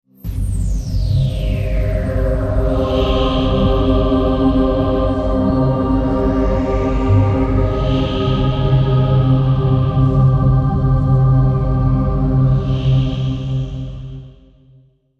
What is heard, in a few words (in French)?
VIRGULE SANCTUAIRES